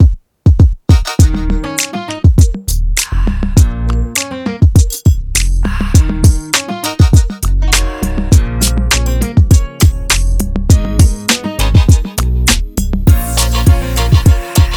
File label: Instrumental